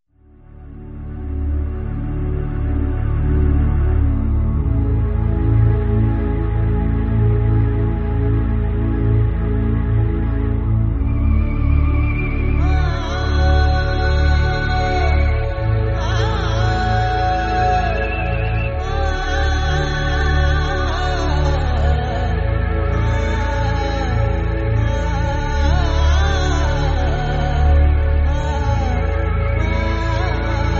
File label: Instrumental; paap 2003